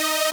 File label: synth